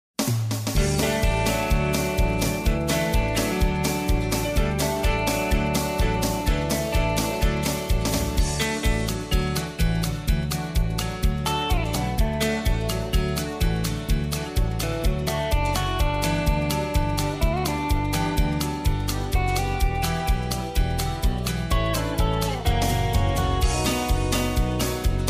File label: with background vocal, square dance music; global music productions; japan callers